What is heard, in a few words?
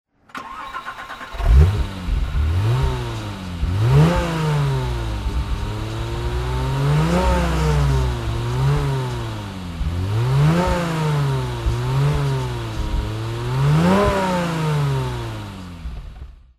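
alfa romeo SZ throttle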